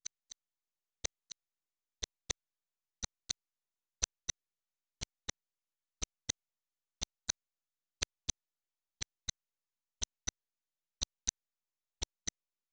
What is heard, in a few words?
ticking sound in normal, clock